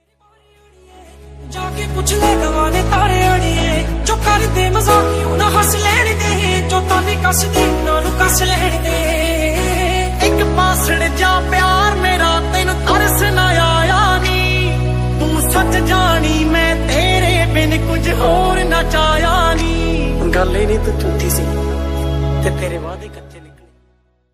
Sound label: hindi music remix ringtone